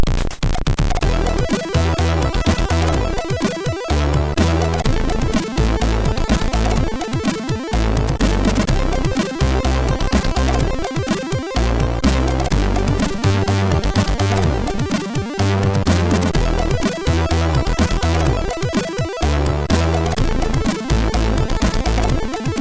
Shortfonk, MOS6581R3; hvsc

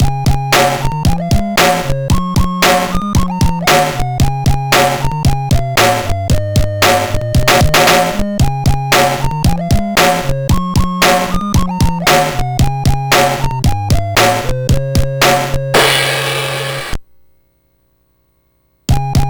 Frogs and Flies, MOS6581R3

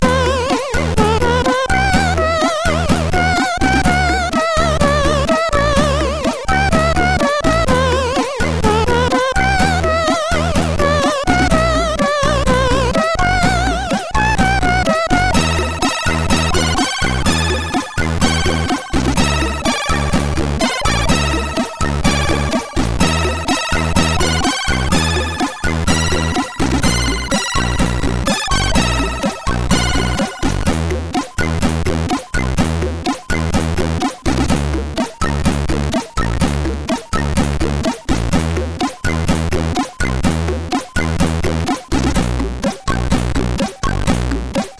Intro Music, CSG8580R5; hvsc

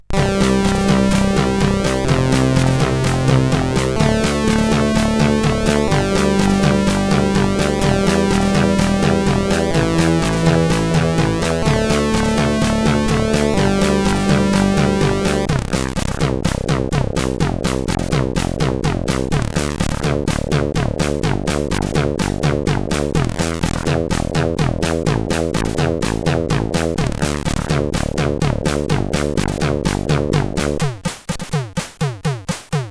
Disco, CSG8580R5

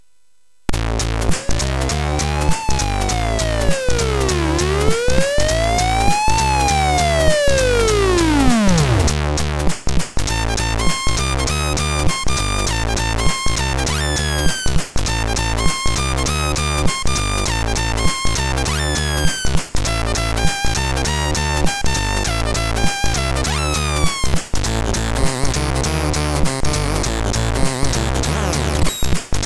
Music Demo Version, MOS6581R3; hvsc

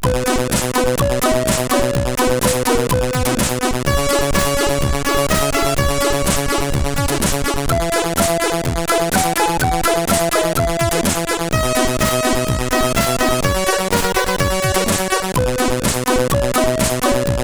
Rursig, CSG8580R5

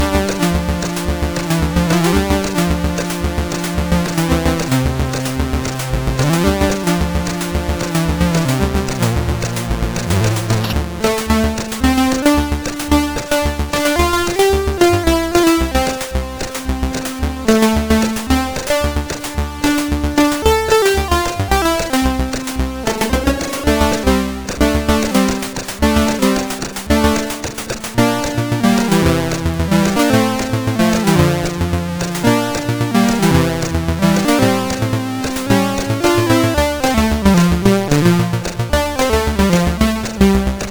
Paddle Mania, CSG8580R5

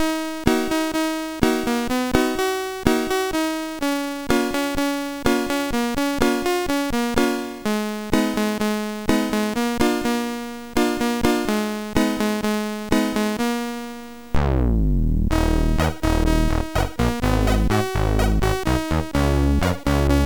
blues sid MOS6581R4

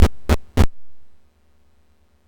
Dragonworld sid MOS6581R4

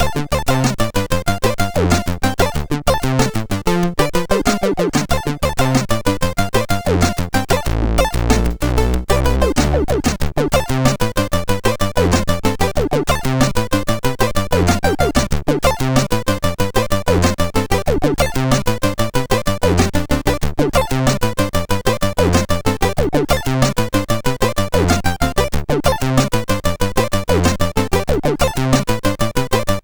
Funkee, MOS6581R3

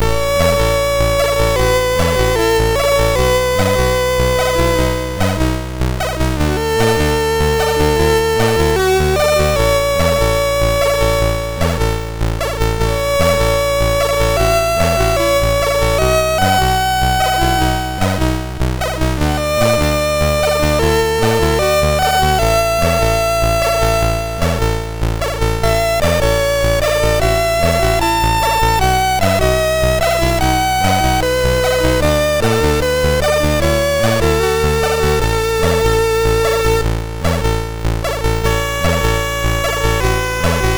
sid 6581; MOS6581R3

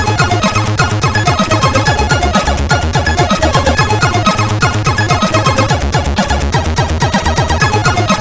Fast, sid CSG8580R5

